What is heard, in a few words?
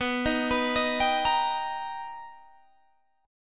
B Augmented Major 7th B Guitar Open G, chord